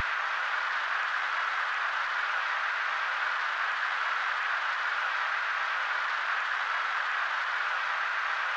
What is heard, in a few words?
rain deep; Undertale